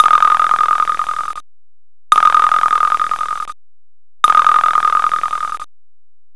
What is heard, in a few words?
flutter echo; glass; spacia